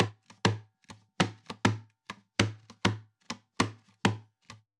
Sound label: guitar percussion 100 bpm; percussion loops; bespoke - Floral v.1